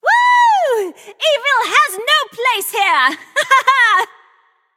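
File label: nonverbal yay; smite; voicepack; izanami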